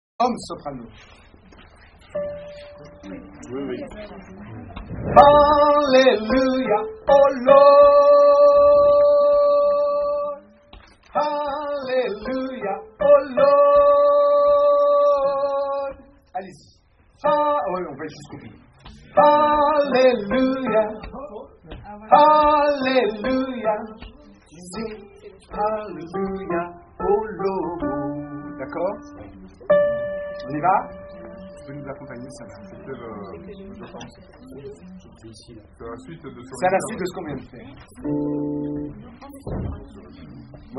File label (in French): soprani hommes